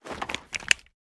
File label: sg550 rotate; tacz; daffas arsenal